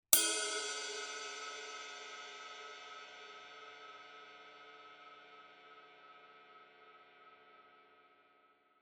Signature Power Ride 20 Body; New Instruments 2021

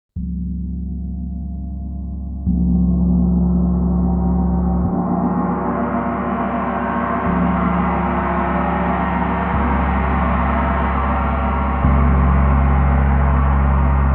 PG Erde 38 Struggle, Gongs

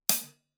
Signature Power Hi Hat 15 Closed; New Instruments 2021

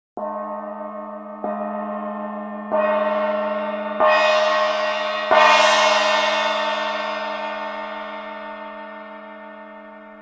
struggle, gongs

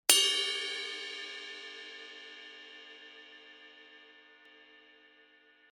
Signature Mellow Ride 22 Bell; New Instruments 2021

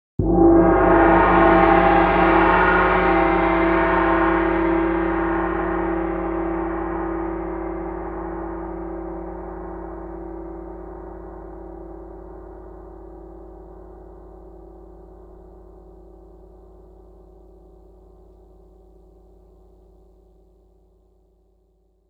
G3 Erde Sweet, Gongs

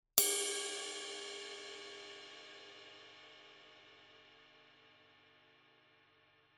Mellow Ride 22 Body; Signature; New Instruments 2021

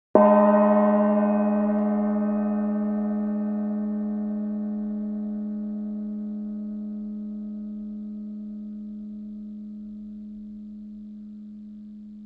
Center Beater, Gongs

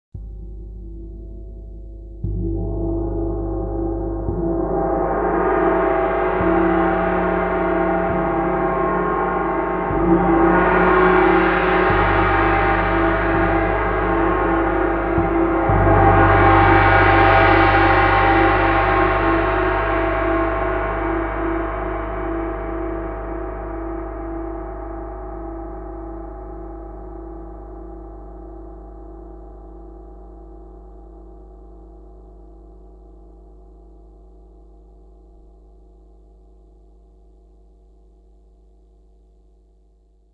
erde struggle, gongs

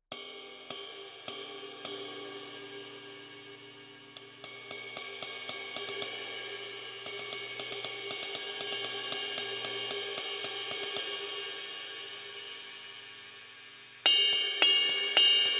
Mellow Ride 22 Pattern; Signature; New Instruments 2021

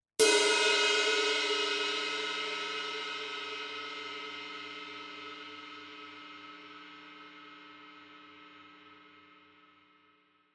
Signature Power Ride 22 Edge; New Instruments 2021

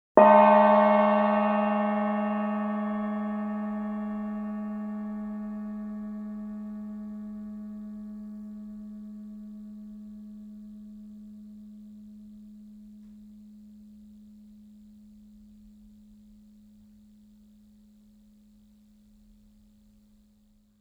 Center, Gongs

